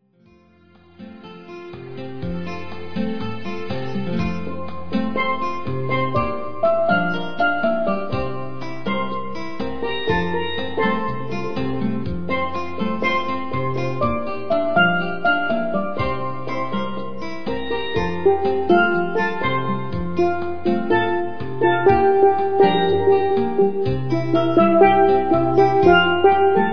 Steel Drum Band; Island Sounds

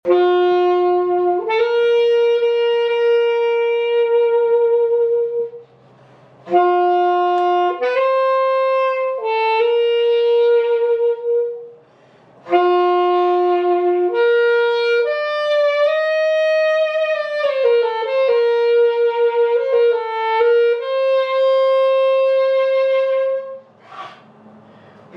on Alto Sax; Here Comes the Bride; Brass Ensemble

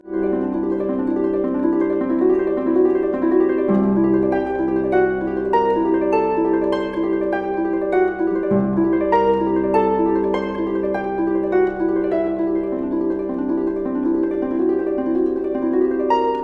harp

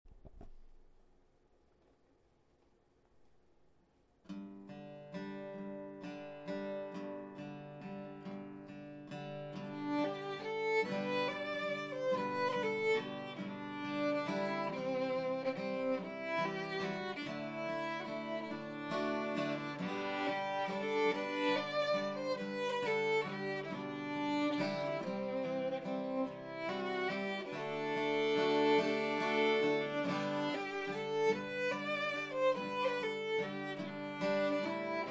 Waltz, celtic band

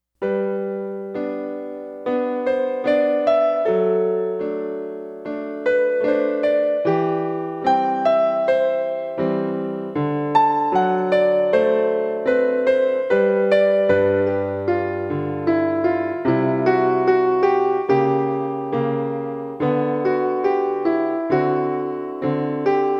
Solo Piano; Arioso

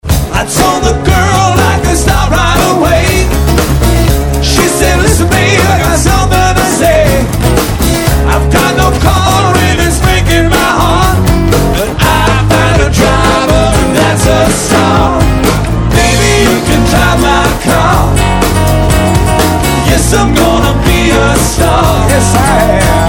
Live Band

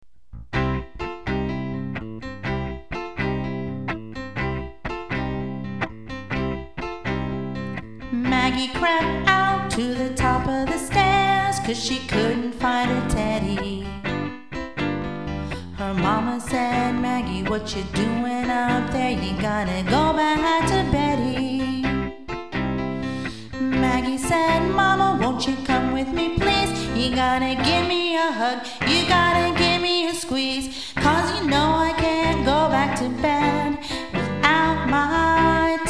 childrens band

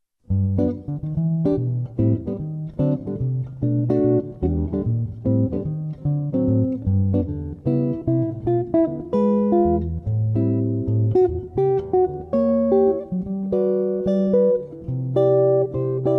guitar; GQ Guitar Services

